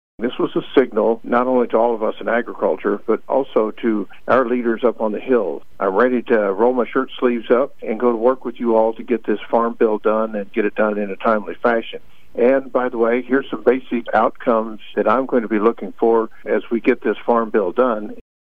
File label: Tone